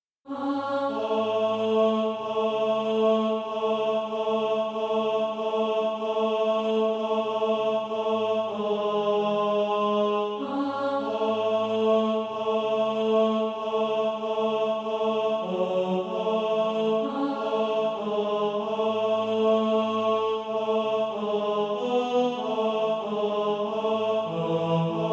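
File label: tenor, Practice Music; SATB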